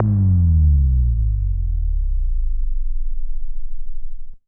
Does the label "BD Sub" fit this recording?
Yes